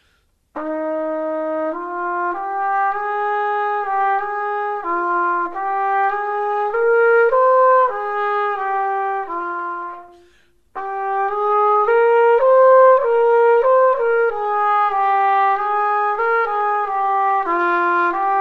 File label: trumpet